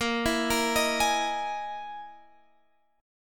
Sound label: E Major 7th Flat 5th chord